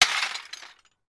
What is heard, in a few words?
impact tiles glass debris; sound effects one